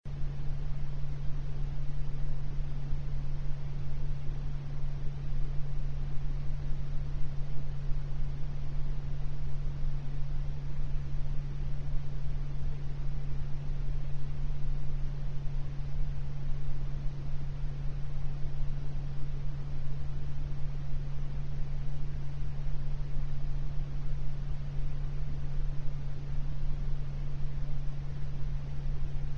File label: pinknoise 40hz binaural